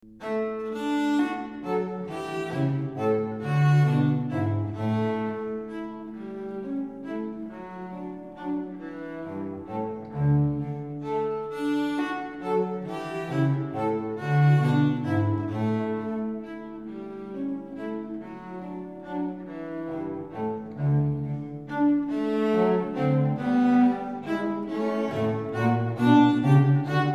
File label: Menuet Allegretto